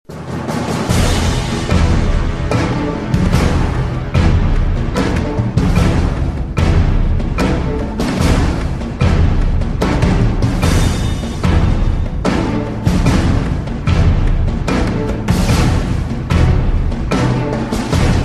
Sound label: Japanese Victory; command and conquer red alert 3 gamerip